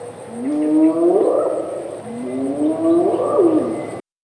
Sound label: hyena